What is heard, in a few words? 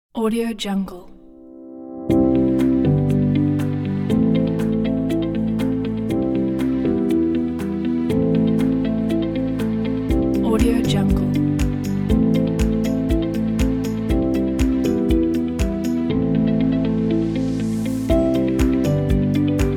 light corporate